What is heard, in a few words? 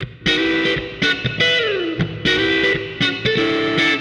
Guitar wet; Imbus Station; lego universe macos windows gamerip 2010